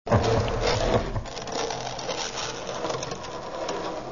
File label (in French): texture grattement grincement